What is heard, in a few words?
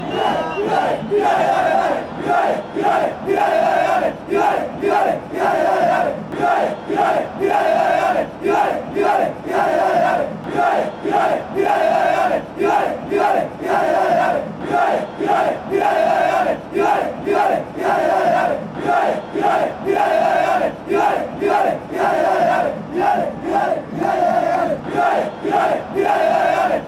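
y dale y dale, football chant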